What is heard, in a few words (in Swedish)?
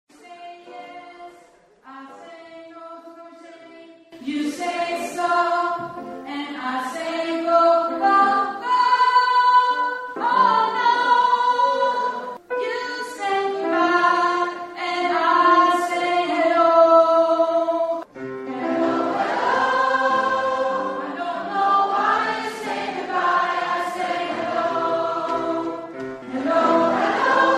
sopran